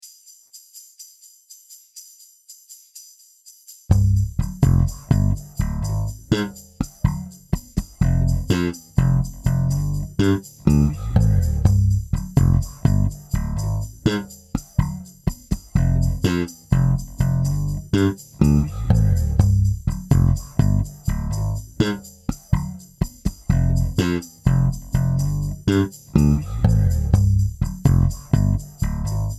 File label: Funky bass 62 bpm